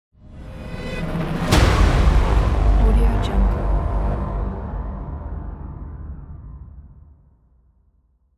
cinematic trailer drums

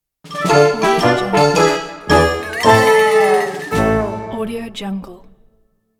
animation cartoon logo

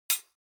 weapons knife hit metal clank

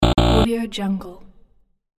wrong answer buzzer

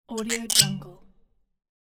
potion bottle cork pop

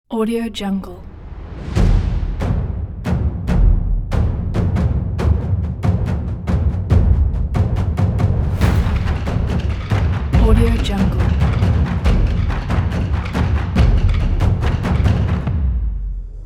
japanese percussion

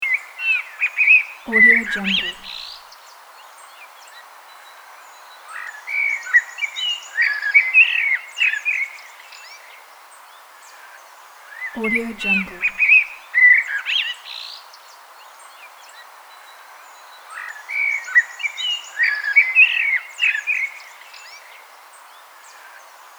bird ambience loop

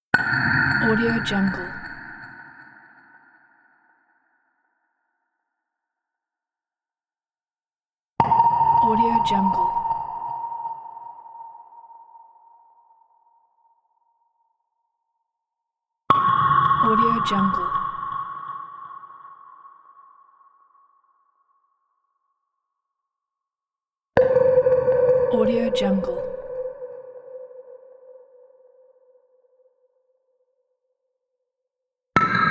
scifi ambience drops; asepticsounds

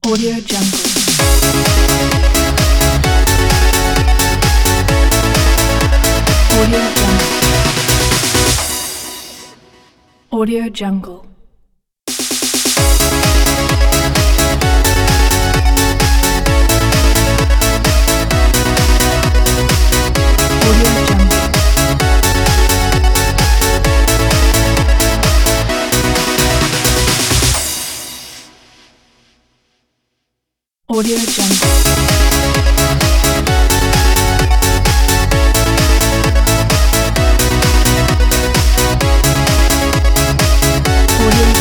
80s synthwave pop logo

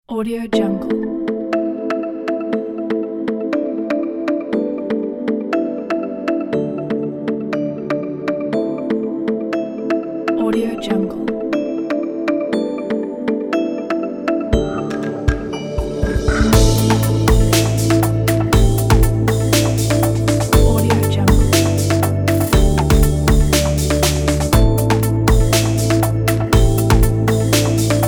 atmosphere corporate technology

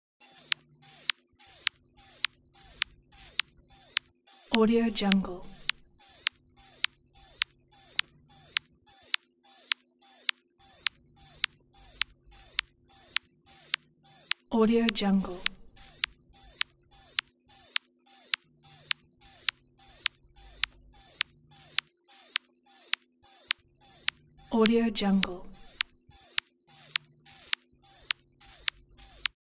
high pitched intermittent alarm type signal

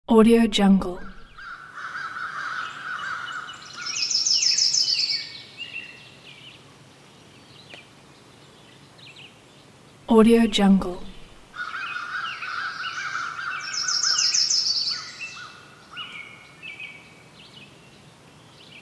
early morning forest ambience with crows; sound ideas

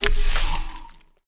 card game magic invisible

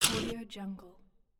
game mechanical controls sound

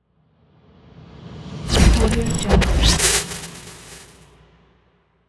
intro glitch logo; quattro boom

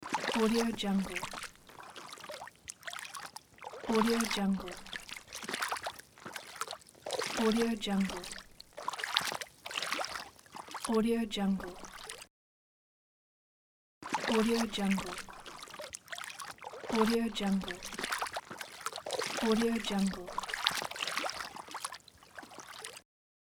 water steps

